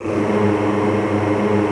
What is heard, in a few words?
choir, G1; Best Service; Extended Classical Choir